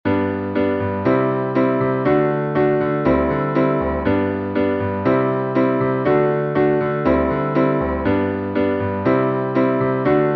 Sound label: Piano